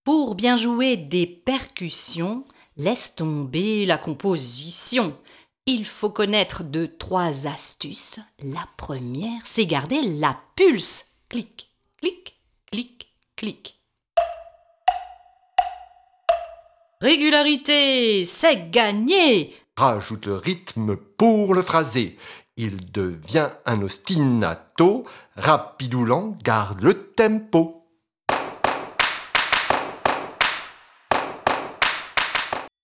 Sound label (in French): Les percussions percutent